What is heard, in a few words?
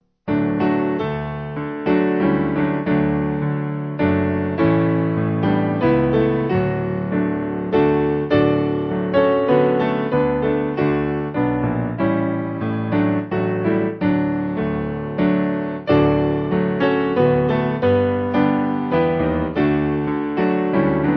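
Piano, church music backing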